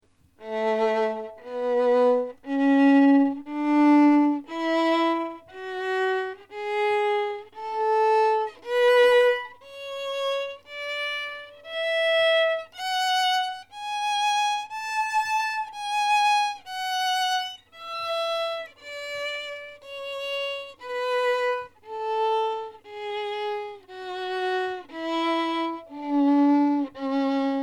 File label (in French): violon 220-880Hz; Variation de timbre entre deux instruments à cordes; Gammes